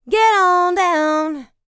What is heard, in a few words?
LICKS, Vocal R&B Dance; LT DANCE